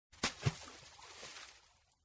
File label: big Splash